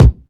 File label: studio kick b key, kick sample tuned to the B note. Loudest frequency: 231Hz; drum single hits one shots; kick drum samples